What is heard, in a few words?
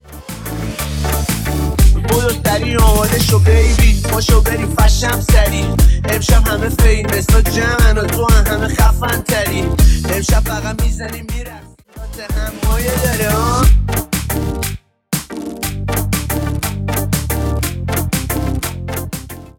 Rap